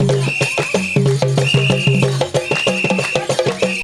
KANKURAN DANCE; Best Service; World Colours

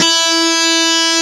B3 guitar; AKAI S-Series CD-ROM Sound Library VOL-8; SET#1 GUITAR

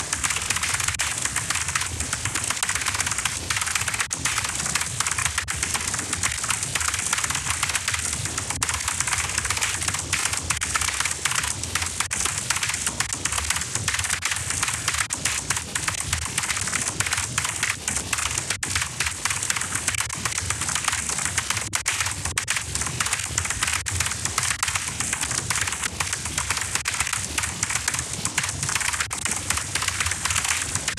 keyboard glitch

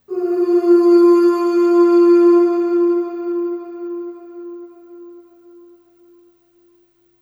Ooh Short F3 Long Reverb; Harmonic Building Blocks

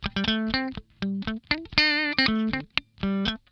DAMPED, Funk Guitar; 90 s Sample CDs; Zero G

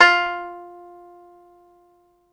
banjo, BANJO+FIDDLE; Best Service ProSamples vol.55 - Retro Sampler; AKAI

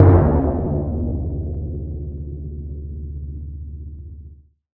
Percussive Stab

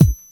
BD, Dance Mega Drums, HIP

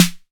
808 SD Tape, Snare Drum

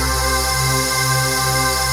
HOUS PAD, House Dance; 90 s Sample CDs; Best Service Dance Mega

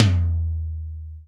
DRY 16 TOM; Greytsounds; Scott Peers Sound Engineering

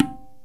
SARANGI TAB

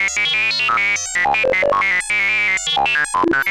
ARP2600, modular syn; Sonic Reality; Vintage Timetraveler

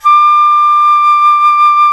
jazz flute

